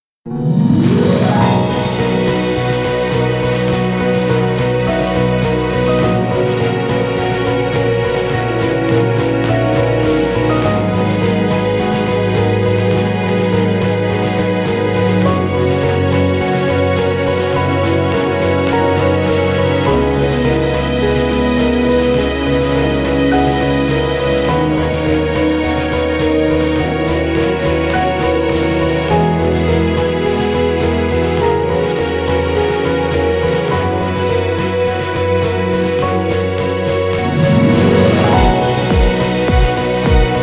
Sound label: orchestra